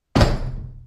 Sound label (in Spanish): puerta